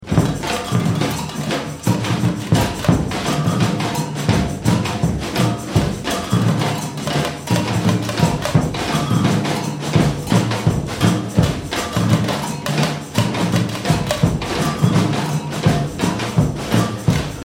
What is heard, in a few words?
groove